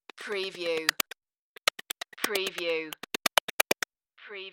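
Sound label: Typing Filter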